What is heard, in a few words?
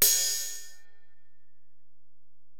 Ride, Alesis DM-PRO, Drum Machines